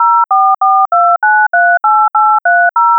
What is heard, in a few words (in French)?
telephone